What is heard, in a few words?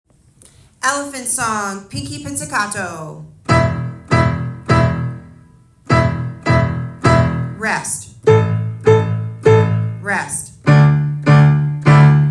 Elephants LH pizzicato